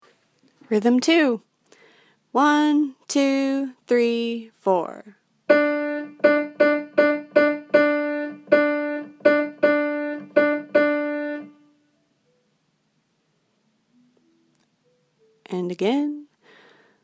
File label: Rhythm